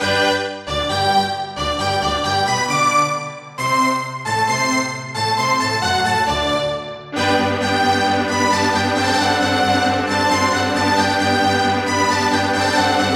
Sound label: Casio LD-80; classical; Mozart; Eine Kleine Nachtmusik partial